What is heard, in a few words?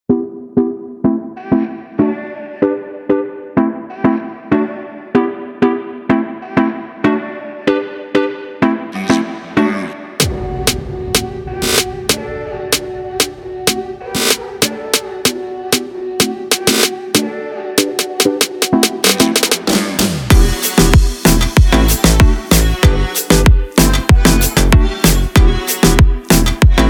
BEAT